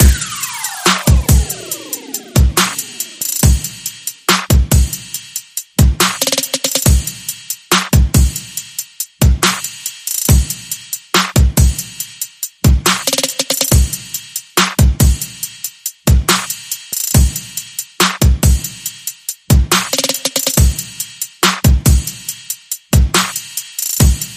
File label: Perc and FX Only